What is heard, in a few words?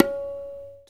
TABLA TAH; AKAI S6000 CD-ROM - Volume 5